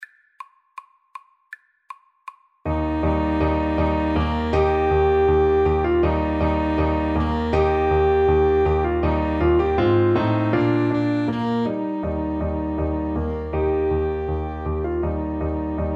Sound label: spring easy, Alto Saxophone Duet